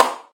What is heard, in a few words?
normal hit clap; Snowy Circles lite